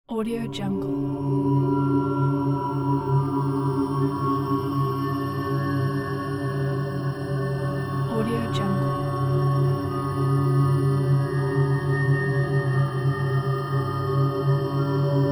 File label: enigmatic atmosphere